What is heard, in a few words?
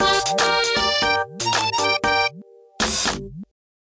Transition